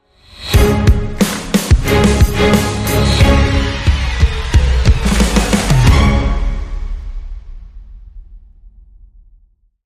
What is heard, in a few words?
Hybrid Sting, Short